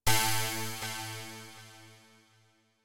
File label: Music Game Ding